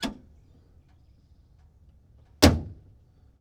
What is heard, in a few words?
1924 Ford Stake Bed Truck Door Open Close; Period Vehicles